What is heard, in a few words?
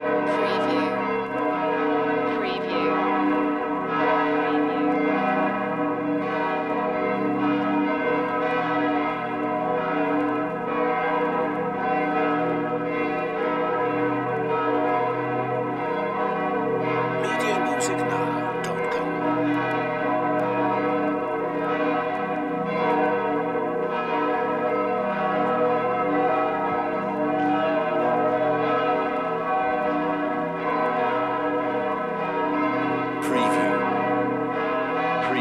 Church Bells Many Distant